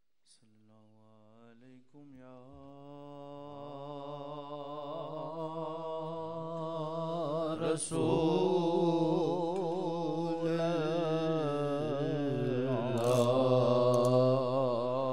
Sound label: naat; main janta hun khud k bataya gia hun main